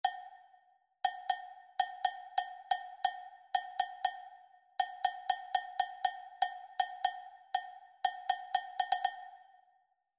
rhythmic error detection

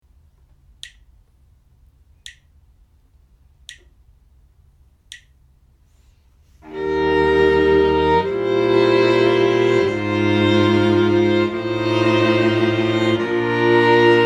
harmonic dictation; eartraining

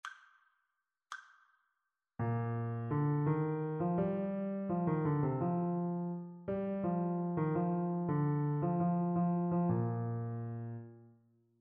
rhythmic dictation

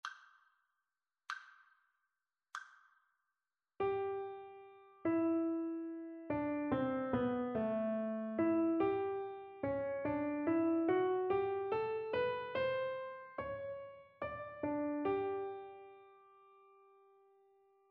partially completed melodic dictation

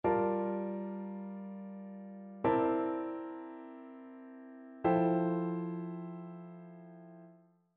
ii V I progressions; ear training

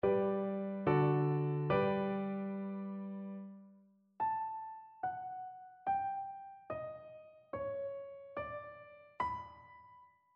melodic fragments; ear training

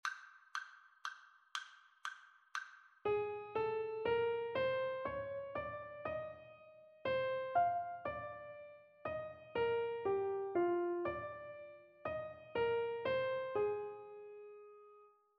melodic dictation; eartraining

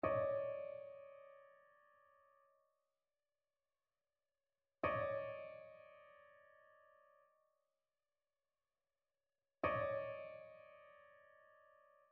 trichord; trichord identification

